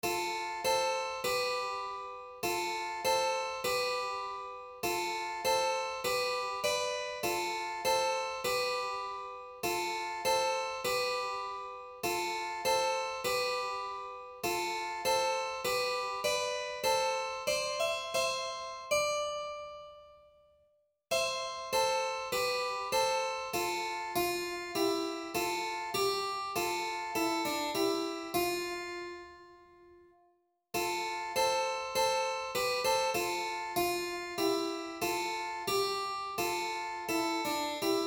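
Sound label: nobilis humilis pythagorean, tuning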